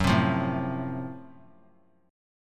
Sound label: Gb Minor Major 7th Flat 5th, chord; strummed; 18,19,20,19; JGuitar